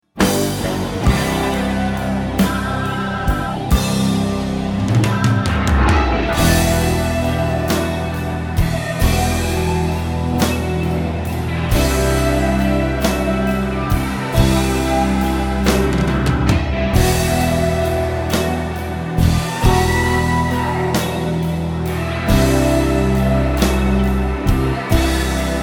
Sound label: Solo backing Track